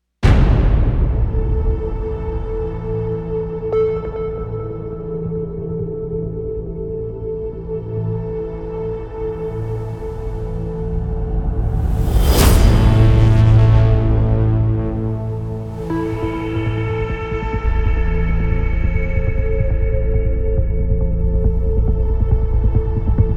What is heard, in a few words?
ambient mix